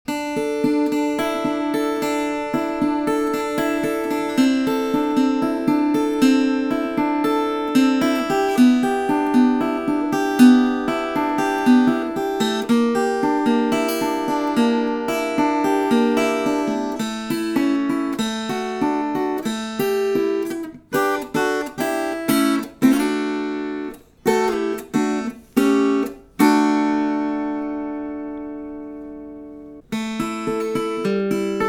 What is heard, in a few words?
Nash Acoustic, Nashville Tuning